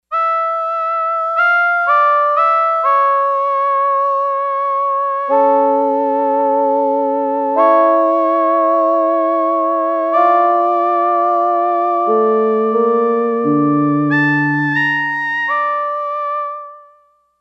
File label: Yamaha DX11 Sy. Voice 2; Factory Preset Voices